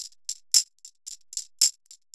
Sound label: TAMB; Zero-G; Chemical Beats